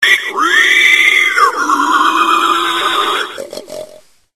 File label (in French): Sanglier